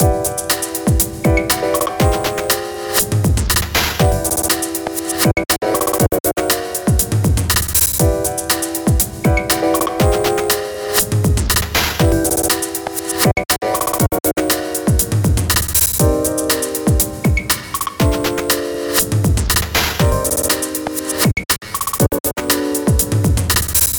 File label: glitch piano; 悲しげなピアノの響きに、混乱するようなグリッジ。不安定な雰囲気の曲です。; [BPM:120]; グリッジ 不安 不思議